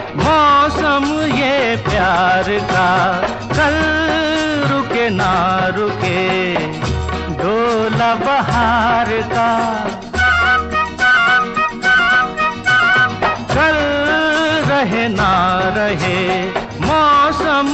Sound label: hindi old song